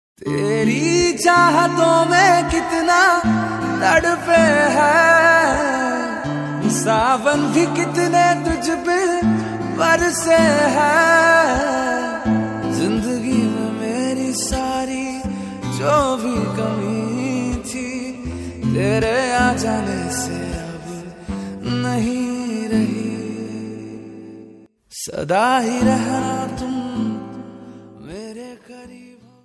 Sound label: Romantic Song Ringtone